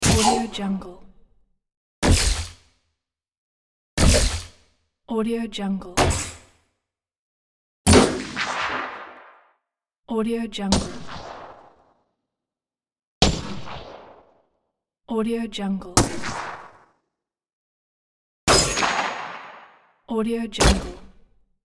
designed game futuristic gun shot

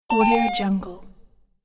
square tone delay

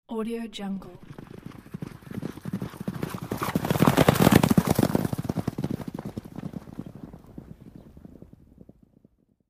horse pass

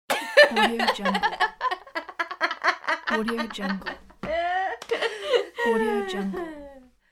female laughter

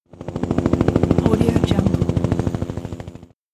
cartoon helicopter passing by

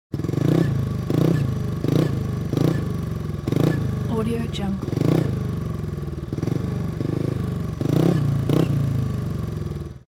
speedway motorcycle revs

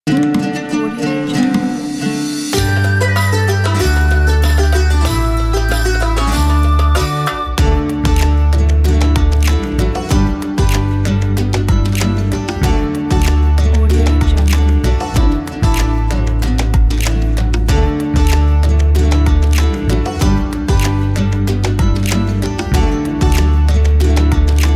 ramadan

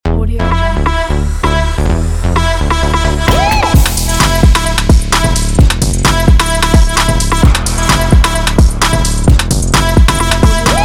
sport trap beat logo

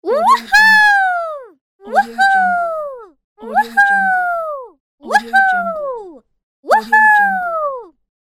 female child woohoo pack

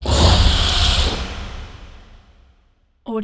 insect creature scream chitter giant insect

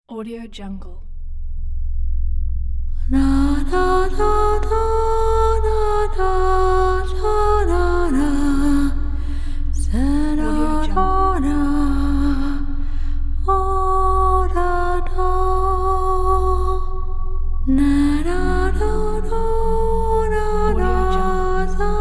woman sings eerily

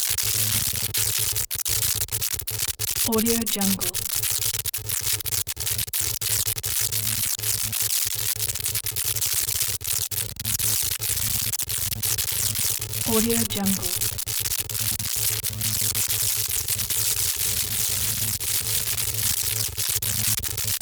video static distortion buzzy glitchy constant; prosoundfx

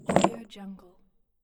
large rusty chain drop

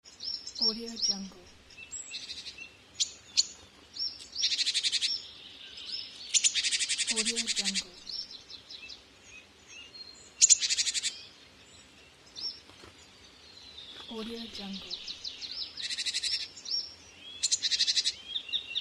forest nature ambience

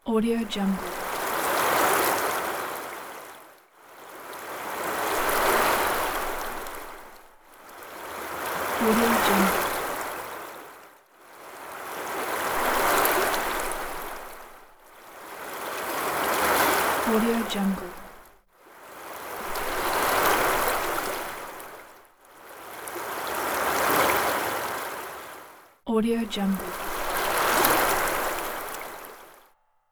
small water waves

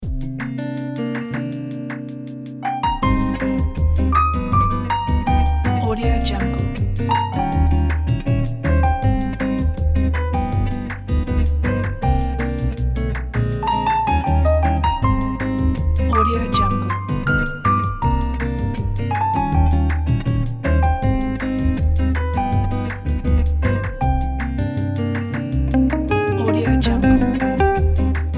bossa pop

